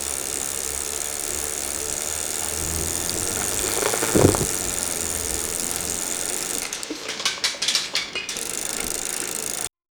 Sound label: bike revving faster